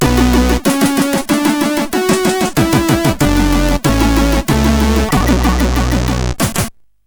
Hydrogenese loader sid CSG8580R5

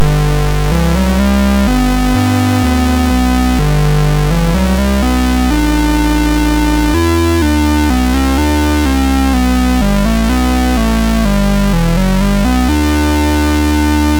MOS6581R3, GAMES; Tank

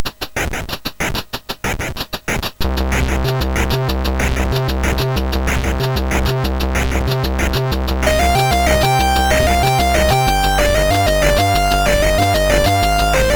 MOS6581R4; sid

